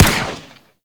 Burst Gun Shot; Warframe; Archwing

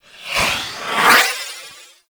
Specter Cast; Warframe; Teshin

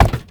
Step Glass; Warframe; Uriel

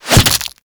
Barb Hit Default; Warframe; Desert Skate; Hit Effects

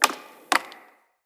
Tree Pine Branch Break; Midrath Island; Ambience

